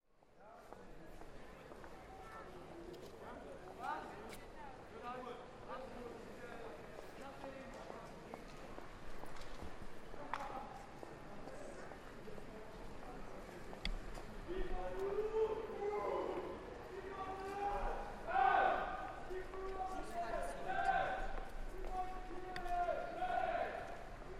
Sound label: football fans; kropeliner strasse